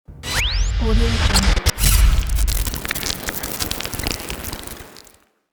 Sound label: glitch logo; fractaldimensions